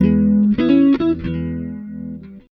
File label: BLUES, Acoustic Guitar Loops